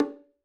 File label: Acoustic Percussion One Shot